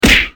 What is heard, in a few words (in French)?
Coup de poing